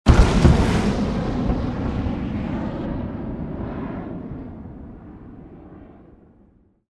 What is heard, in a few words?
explosion